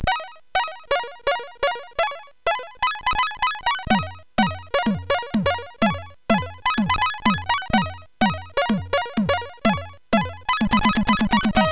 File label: Groove, sid CSG8580R5